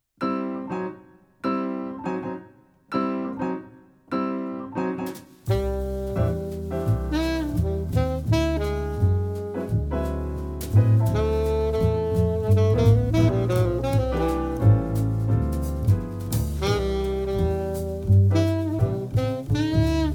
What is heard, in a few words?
Piano Quartet